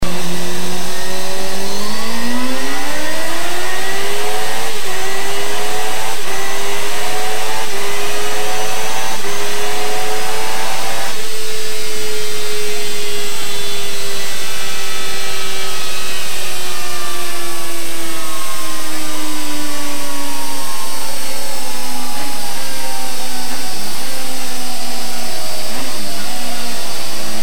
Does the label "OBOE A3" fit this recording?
No